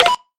Toggle Turn On

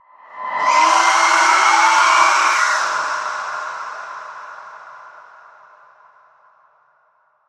death

